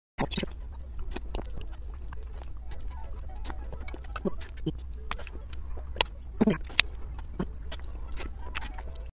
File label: Eating cream filled cake